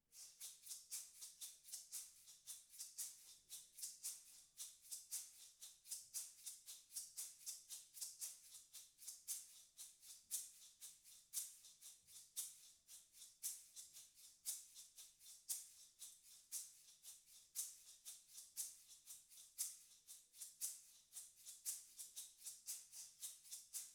Mono Room

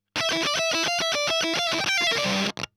EL Fuzz End

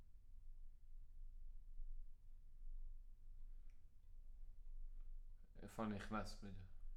Bass Room

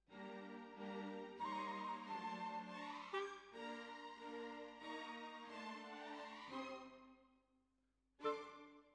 Bassoon, Jazz for piano

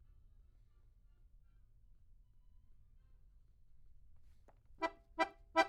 Accordion; Jazz

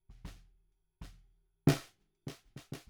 SNR Bot

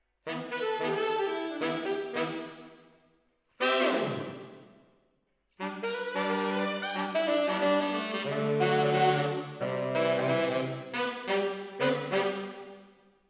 MKH AB, piano

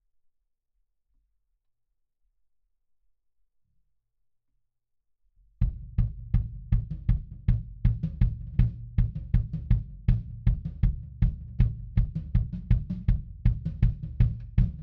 Kick In, 160 BPM

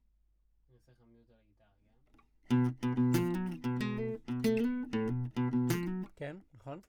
Classic Guitar

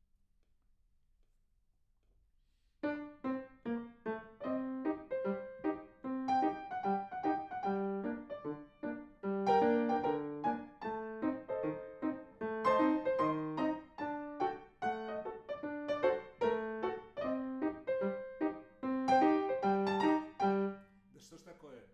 PIANO

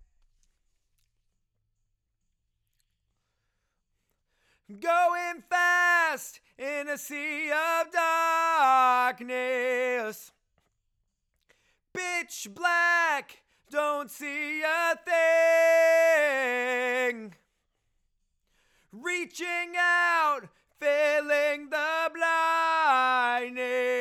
Chorus

